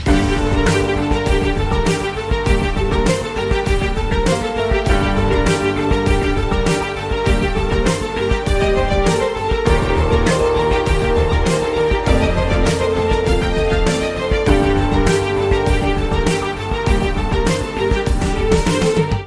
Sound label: Ringtone